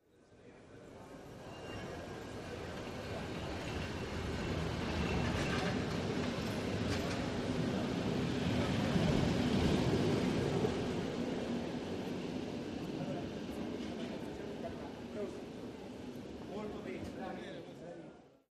Italian Train Station Platform, W Announcements, Train Away, Light Walla, Light Activity